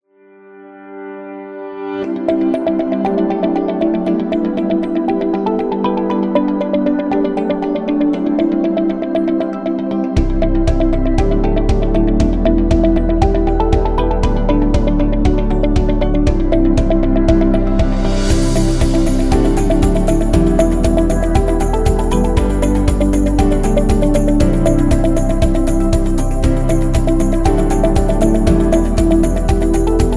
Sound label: Major Success, corporate music; corporate music vol 13